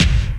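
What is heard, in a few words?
GATE kick; Crypton - DrumBase5000; GATE KITS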